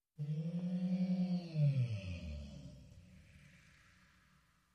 Oohs Alone

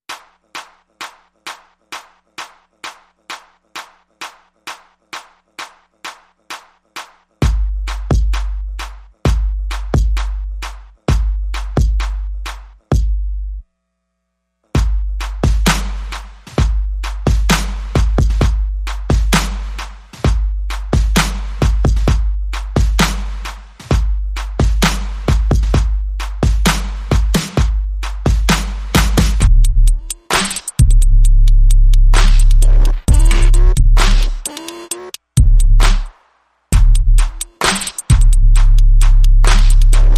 Drums and Perc Only

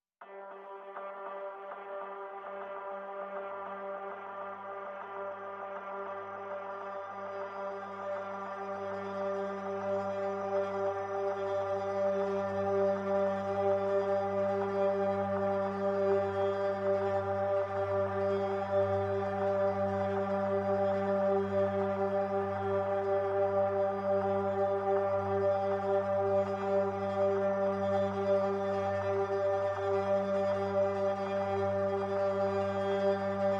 Pads Only

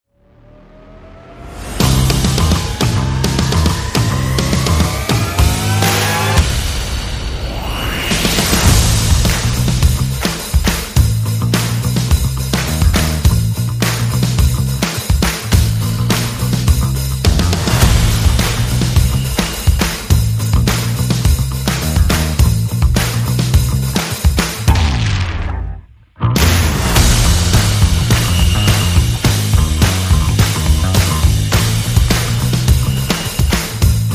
Percussion Bass and Effects

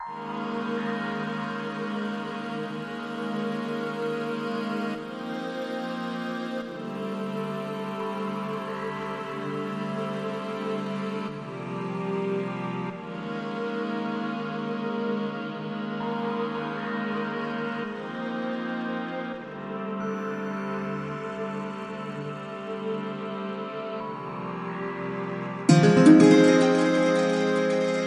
Spectral Directions, No Drums No Bass

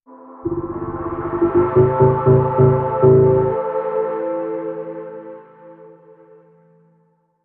Pulsing Aura Sting

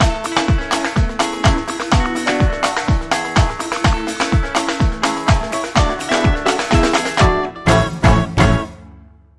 Sting No Vocals

